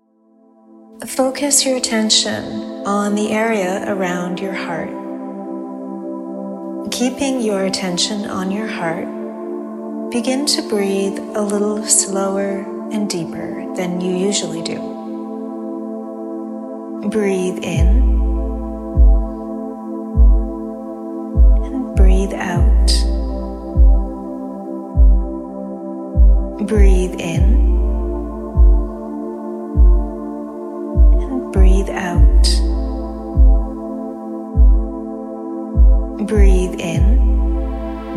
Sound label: Coherent Breath 10 Sec Cycle